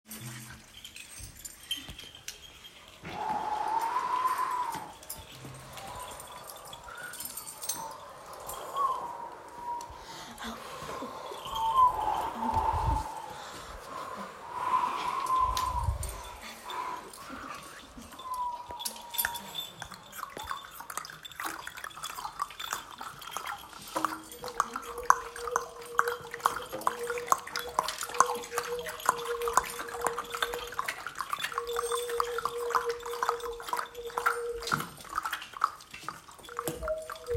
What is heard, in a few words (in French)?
ambiance sonore sakura